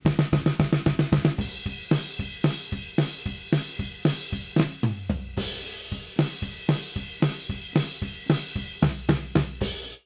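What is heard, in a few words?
drum dual mono 15ms original mono, Dual Mono with right delayed 15 ms + Mono Original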